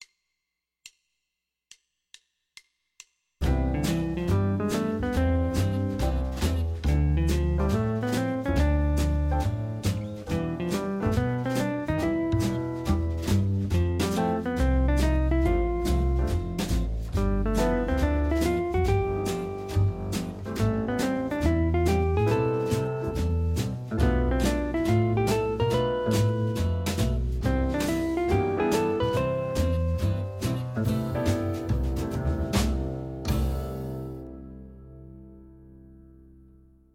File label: bebop modes, guitar